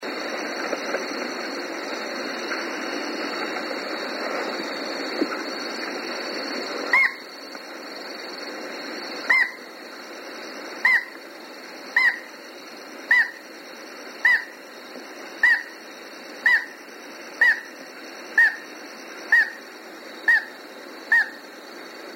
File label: Xenoglaux